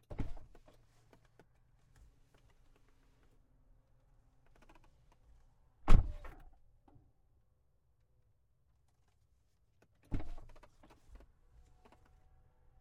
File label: Ferrari, 812, Doors, Various, Interior; 812 Superfast 2018; AMBEO3; Pole Position